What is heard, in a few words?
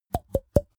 snap cheek sequence; soundpool